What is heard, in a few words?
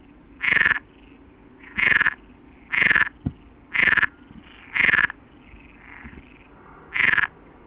perezi, waterfrogs